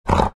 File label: grunt, greevil; courier